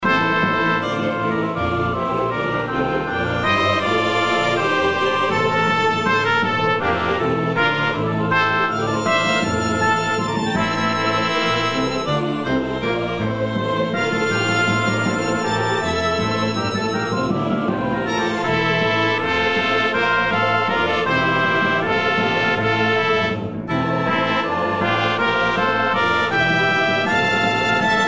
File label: FIRE Synth